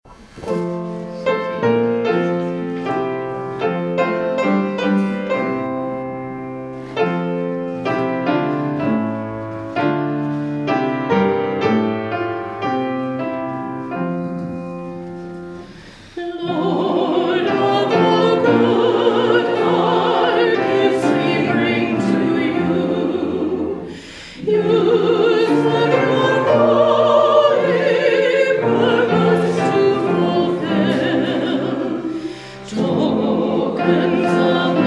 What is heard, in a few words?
Hymn; Lord of All Good